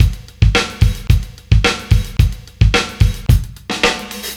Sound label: live beat; beats; Sonic Foundry (Sony Creative Software); Ugly Remnants VOL-2